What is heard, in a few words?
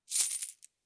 coin sack drop; soundscrate